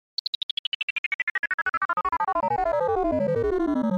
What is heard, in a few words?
Alarm Beep Pulse